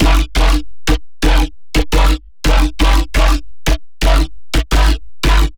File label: Bass 172 F Bug